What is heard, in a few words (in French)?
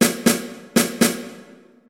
Fa- rythm